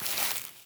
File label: grass step, footsteps; NS Studios - ultra sound collection